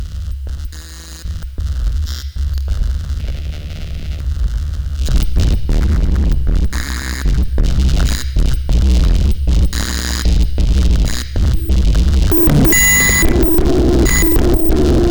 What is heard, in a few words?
feedback